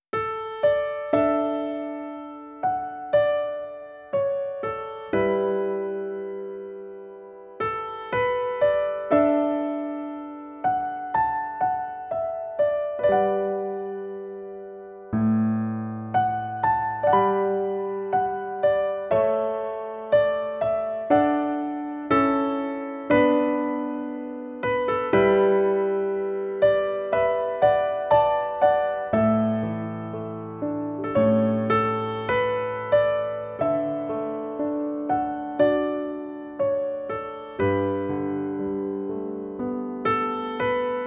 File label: piano; Clannad; CLANNAD クラナド OST